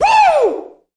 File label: voice "woo"